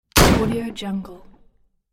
gun shot; alexnaobum

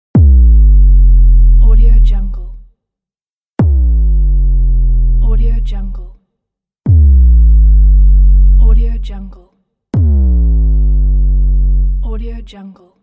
sub bass drop impulse; phillipmariani

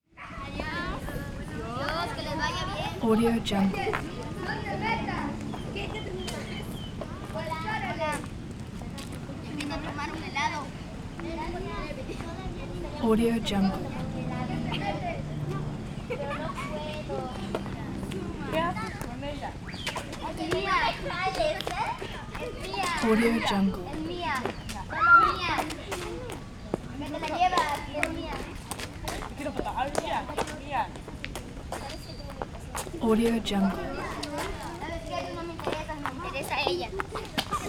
mexican kids play exterior courtyard ambience; prosoundfx

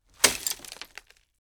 bullet impact

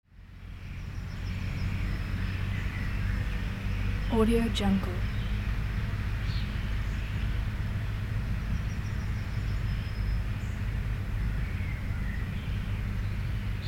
tractor distant work on the forest edge

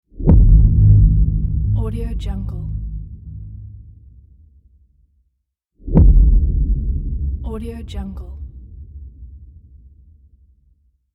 cinematic trailer bass blow

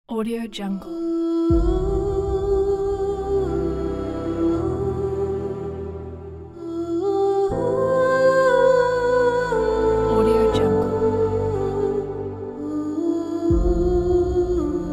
emotional lullaby female vocals

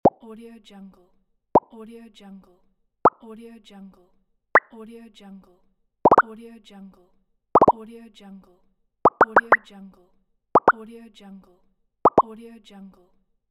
pop up message

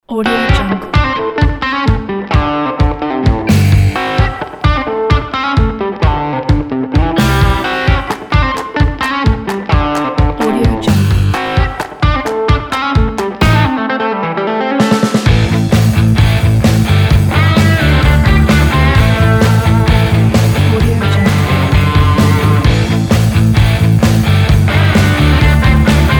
country rock

